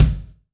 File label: Kick, drum